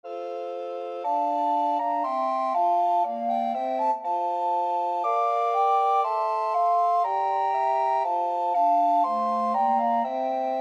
recorder quartet

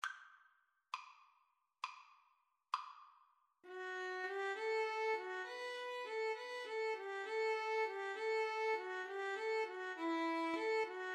Violin Cello Duet; pastorale

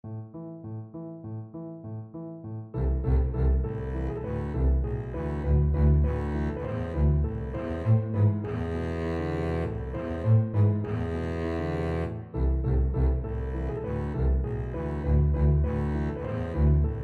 danses des cygnets, double bass

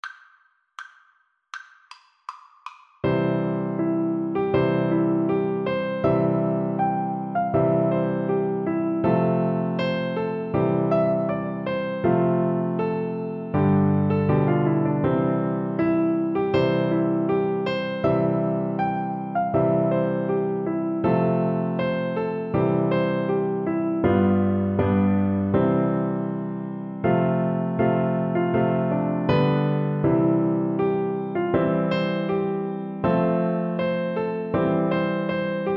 Oboe

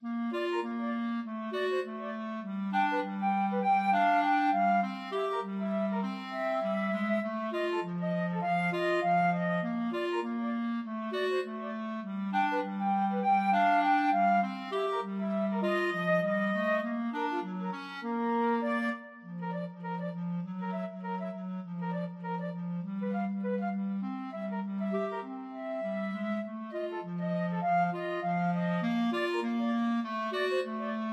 silver sand rag flute clarinet duet

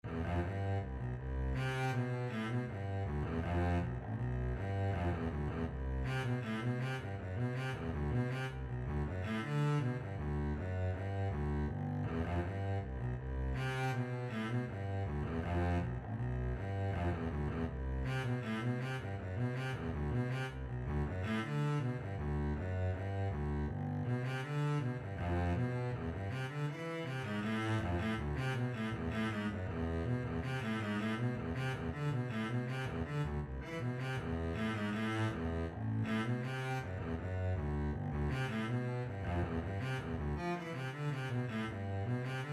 bach bourree, Double Bass

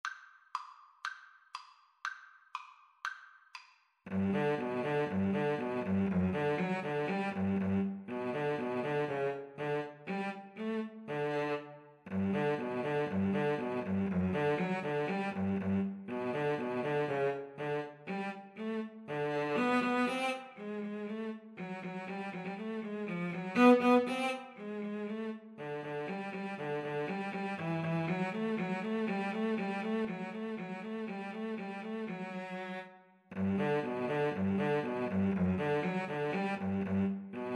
Viola Cello Duet

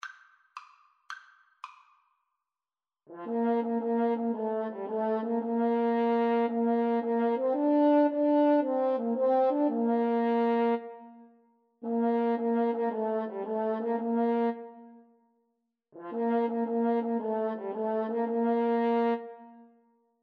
itsy bitsy 2HN, French Horn Duet

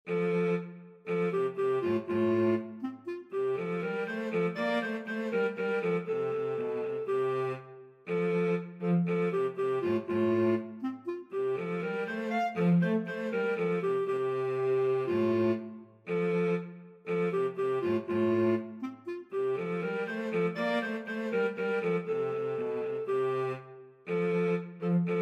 clarinet cello, clarinet-cello duet

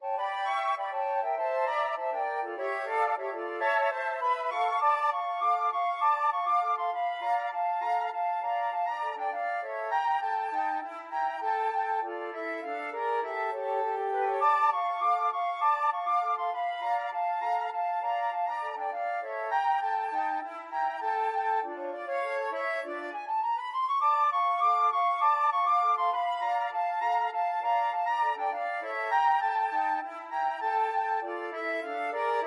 flute trio

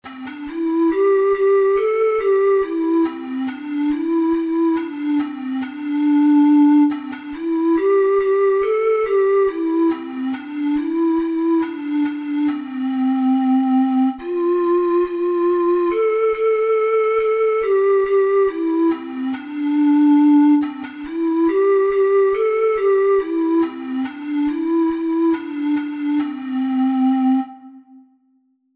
alabama, ocarina four hole

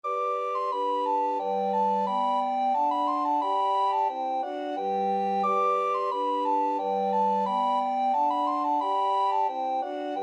deck the halls, recorder quartet

